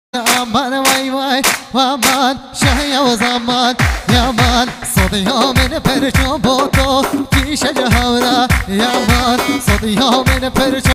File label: halay kurdish music; Mükemmel ötesi halay; kürtçe